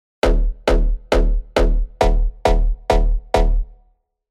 Offbeat Bounce, techno bass; Spire; revealed spire techno bass vol 1

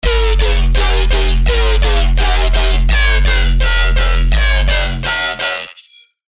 Ragga Heatcheck, Serum 2 hard bass; revealed serum 2 hard bass meltdown

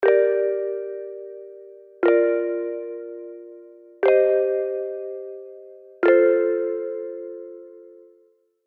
Shine Bright, Spire; alonso happy holidays 2021 spire soundset

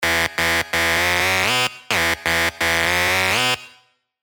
Sizzle, Sylenth1 dirty dutch leads; revealed sylenth1 dirty dutch leads vol 1

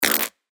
percussion; Denki; revealed percussion vol 3

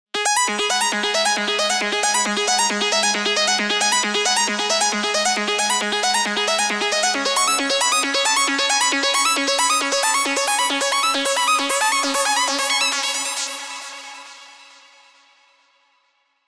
Dub; Spaceman Dub; alonso 19 year anniversary pack; Spire

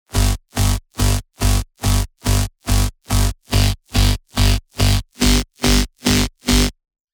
Reversed; Spire; alonso 18 year anniversary pack

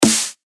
Snare Launcher; revealed claps snares vol 2

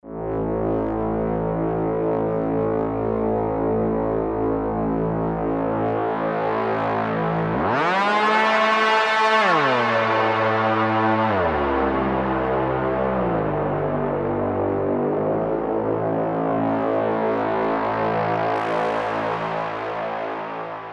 pads; revealed spire pronoia pads; Metacognition

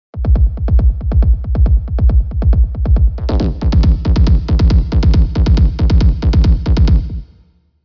Sub Gallop, techno bass; Spire; revealed spire techno bass vol 1

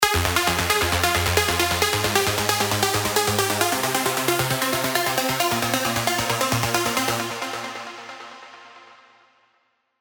trance leads; revealed spire trance leads vol 1; Distant Signal

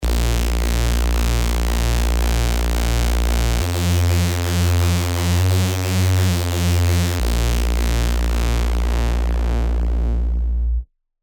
Reese; big room techno; Propaganda; revealed serum big room techno vol 5

